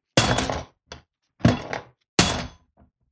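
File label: metallic mats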